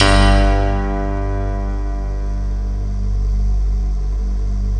JV-1080 BELLS PNO; PIANO-EXP; Syntec; Wall of Sounds

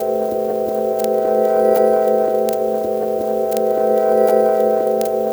CLEANSING, Pads; Distored Reality 1&2

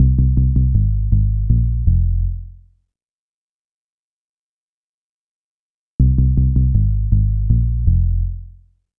bass, Drum & Bass; Best Service ProSamples vol.03 - Drum & Bass 1; AKAI

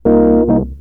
Rhodes, Keyboards; Total Drum&Bass

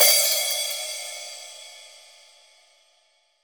HH OP, AMB KIT

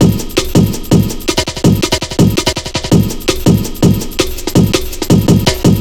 165, Drum Loops, Drum & Bass

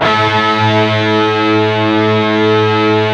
PWR CHRD A#3, Guitars; PRS

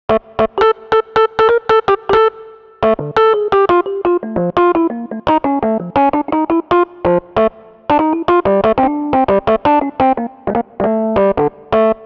Rhodes Solo, Cinematic Funk; Sony Creative Software; Urban Tone Poems

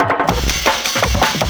LOOP SD, Drum & Bass, 160 BPM

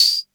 CR78 TAMB; Roland CR-78; Roland CR-78 Ableton Project

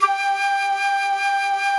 FLUTE G4; Greytsounds; Scott Peers Sound Engineering

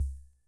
Kay R-12 Rhythmer Kick Hat, Drum Machines; Kay R-12 Rhythmer Sample Pack; 300 Drum Machines

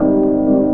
CHORD, Drum & Bass, 160 BPM; Ueberschall; Drum & Bass Resonance

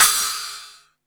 MICRO CYMBAL; THE GENERAL

